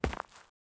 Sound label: walking